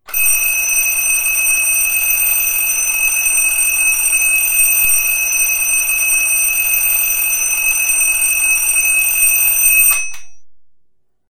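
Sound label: old alarm clock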